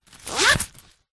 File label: foley purse pouch leather coins inside zipper